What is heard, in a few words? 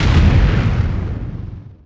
large explosion; Warzone